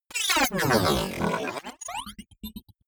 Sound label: sci-fi electronics charge digital phase